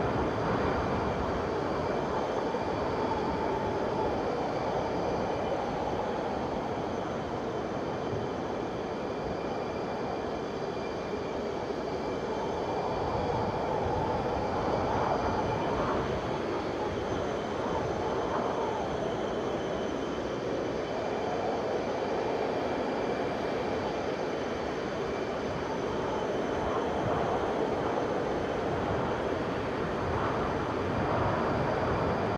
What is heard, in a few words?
Main Game Ambience; knight rider